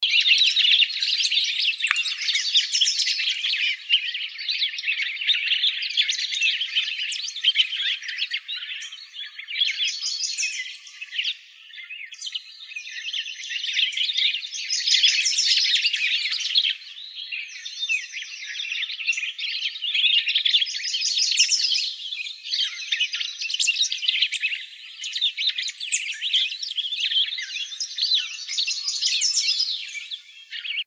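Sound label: Cassin's Finch, Finches; CAFI; deviche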